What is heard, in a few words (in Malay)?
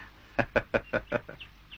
gelak